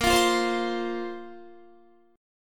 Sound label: A sharp Suspended 2nd down Guitar; A#sus2 strummed